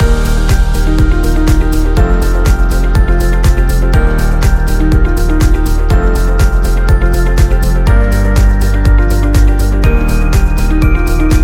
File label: The Corporate Loop Upbeat